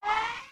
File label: Buzzsaw Charge